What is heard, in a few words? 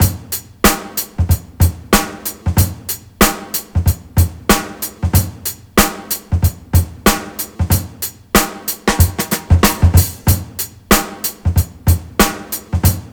93.5 Jazzy Bouncer, Breaks; Lockdown Breaks